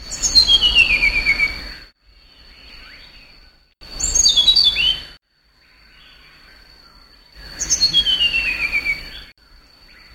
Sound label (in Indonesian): sikatan cacing